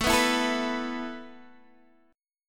A Suspended 2nd A Guitar DADGAD, chord

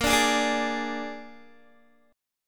A sharp 7th A sharp, Guitar; strummed; chordshape

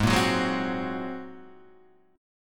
A sharp 7th Suspended 2nd Sharp 5th

